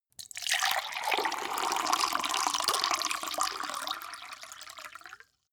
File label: glass pour hard; berufe; gastronomie; soundpool